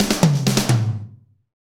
Medium Tekno; Northstar - Drumscapes Roland

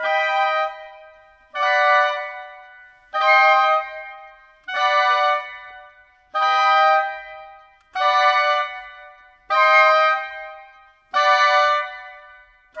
Clarinet, Flute Oboe; Cinematic 01; Sonic Foundry (Sony Creative Software); Orchestral Series VOL-3 - Cinematic

